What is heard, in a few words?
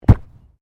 Body movement Organic Impact Heavy; Body movement foley to simulate falls and deaths; Wrestling And Struggling Sound Effects